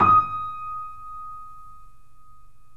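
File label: D#5, Bösendorfer, 3D Pianos; E-MU Producer Series Vol. 5; 3-D Audio Collection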